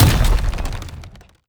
strider step; Strider Remake

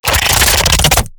Stealth Attack Start; Lurker; Evolve; Gorgon

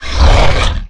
Rock Pick Up; Evolve; Goliath

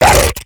Exertion Light; Evolve; Gorgon

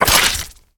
Web Shot; Lurker; Evolve; Gorgon

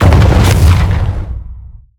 Mortar Cannon Fire; Evolve; Behemoth

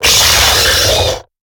Stealth Attack; Lurker; Evolve; Gorgon

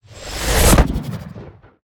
Ability Mimic Exit; Lurker; Evolve; Gorgon

